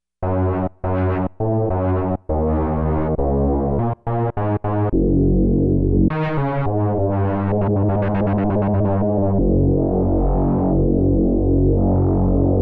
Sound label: Angry Low end